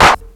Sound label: CLAP, Big Beat; Big Beat Samples & More; AKAI; CLAP KIT